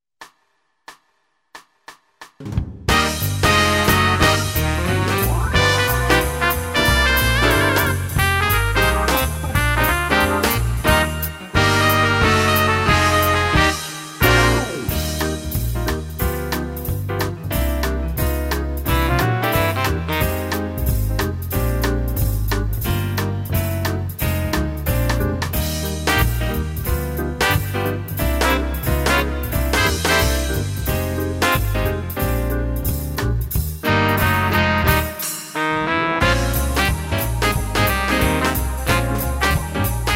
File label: brass minus